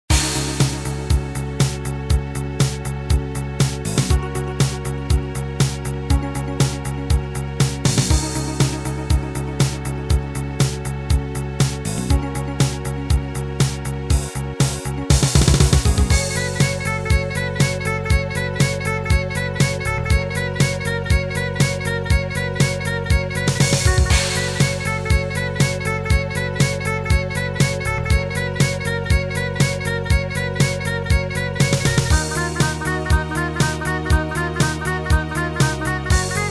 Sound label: midi; Wacky Wheels; ashes